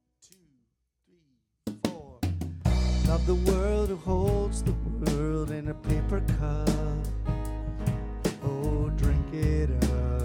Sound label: chorus; Soup